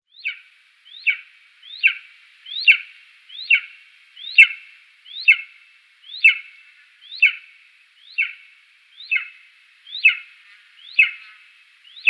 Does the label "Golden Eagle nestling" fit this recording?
Yes